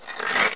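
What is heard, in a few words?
Attack Bow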